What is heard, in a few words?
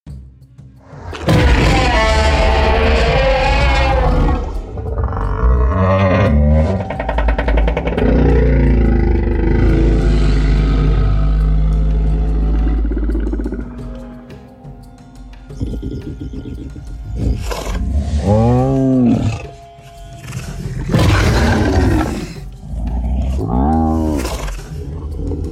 Monsters & Beasts; BOOM Library